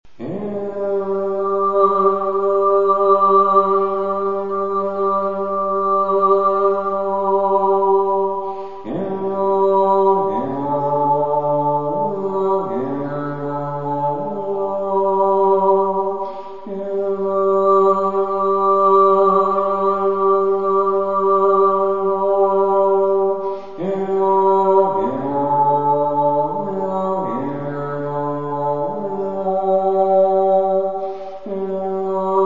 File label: bird gong melodie